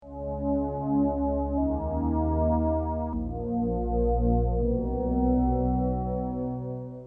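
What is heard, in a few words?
Prophet 5 soft pad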